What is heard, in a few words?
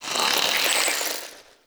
Infested Plant Murmur; Ambience; Props; Warframe; Infested Eris